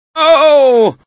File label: scream; kleiner; e3 lab; hl2